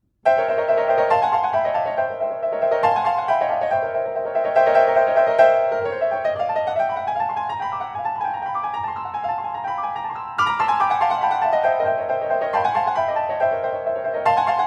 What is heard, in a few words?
Polichinelas, Piano; Heitor Villalobos